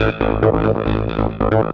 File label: vfx 138 a, Techno, vocal-fx; Techno ID; atmo+fx-loops